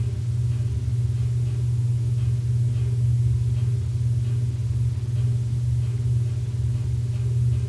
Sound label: furnace; de alphacode wi